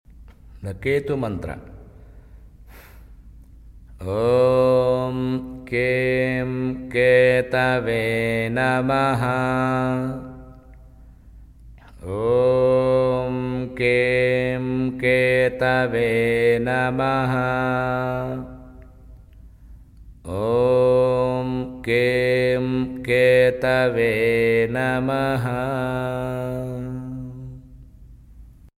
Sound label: Ketu Mantra